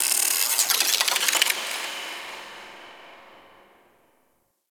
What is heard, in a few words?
Tech Small B, Ambience; Warframe; Cephalon